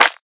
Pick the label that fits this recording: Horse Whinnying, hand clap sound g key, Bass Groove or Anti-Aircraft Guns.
hand clap sound g key